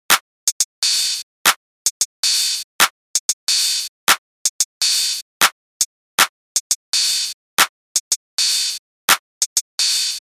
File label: drums; Multiplayer Piano